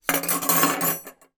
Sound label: food mixer whisk attachments down on table